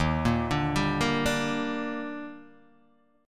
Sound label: Eb Major 7th, Guitar Open D; 1,1,0,1,1,0; down